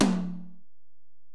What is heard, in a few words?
Tom, Alesis DM-PRO, Drum Machines, Toms